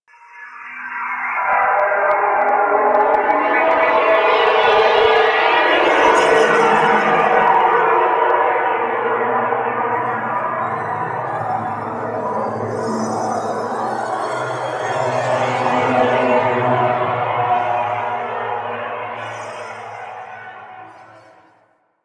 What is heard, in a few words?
Interior Texture, Ambience